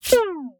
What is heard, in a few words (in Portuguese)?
feedback negativo